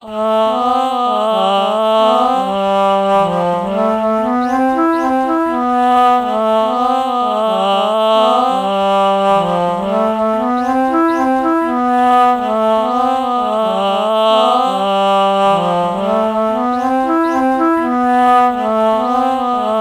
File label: alto flute ah melody morph low